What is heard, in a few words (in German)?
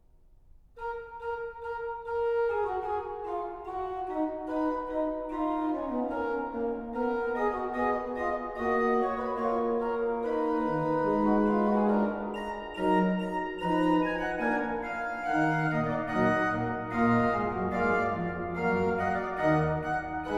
Fuge d Moll; BWV 539